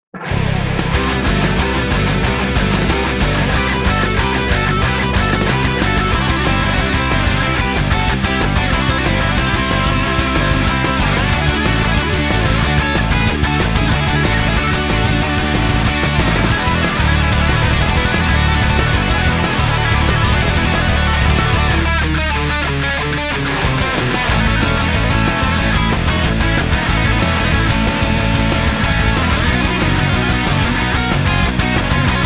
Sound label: Rock Cover; Mario & Luigi - Paper Jam; Boss Battle